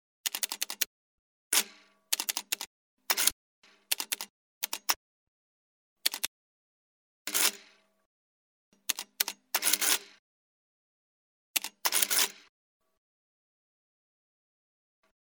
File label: Typing and printing label